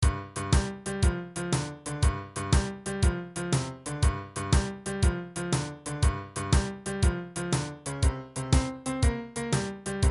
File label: blues, piano